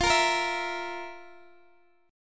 F Major 7th Suspended 4th, Guitar, Open D

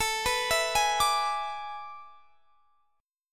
A Major 7th Suspended 2nd Suspended 4th; AM7sus2sus4; arpeggiated

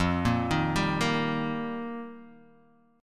F 9th Suspended 4th, Guitar, DADGAD; 3,1,1,0,1,x

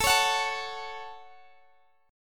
F sharp Minor, guitar

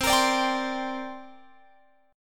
C 7th Suspended 2nd, Guitar, Open F; C7sus2 strummed; x,19,22,21,22,19

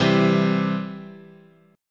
C Major, guitar chord

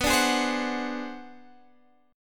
B Minor 6th Flat 9th B, chord

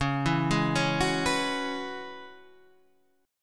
Db Minor 11th Db down Guitar Standard; Dbm11 arpeggiated; JGuitar

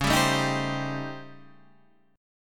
A 7th Sharp 9th Flat 5th

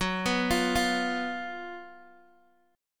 Gb Major 7th Suspended 4th Gb Guitar Open G

